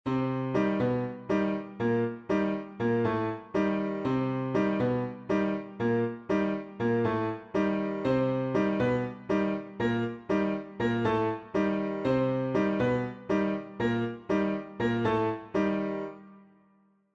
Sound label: montuno demi